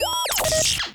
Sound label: notifications; RED; Hydrogen-One